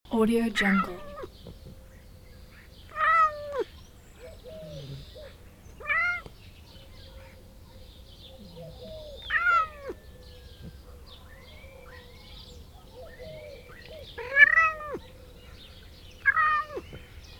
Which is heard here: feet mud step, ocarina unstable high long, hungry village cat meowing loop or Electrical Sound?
hungry village cat meowing loop